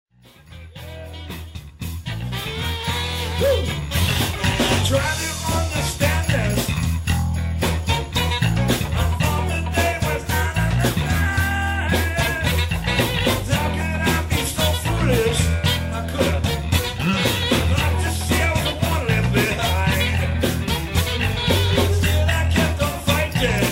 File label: funky music live